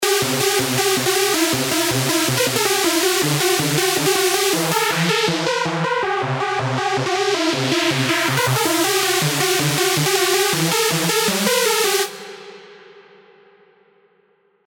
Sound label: LD, Sylenth1; Hardcore Strikes Back; alonso 18 year anniversary pack